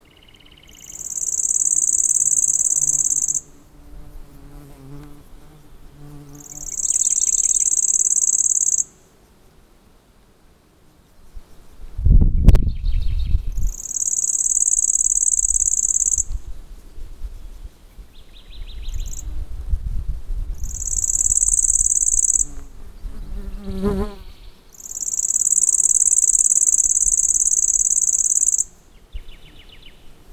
warbler lanceolated Locustella lanceolata lanceolata; Craig Brelsford